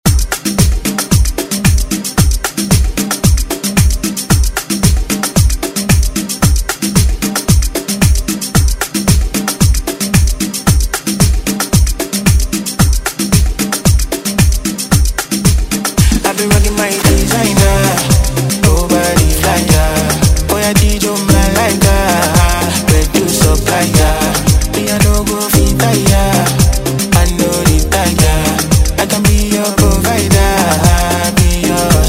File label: Afro Moombah; Redrum